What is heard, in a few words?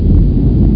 rumble; dosgames; roc